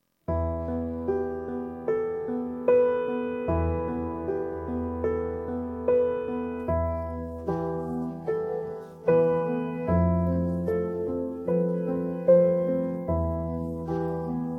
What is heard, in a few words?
piano Version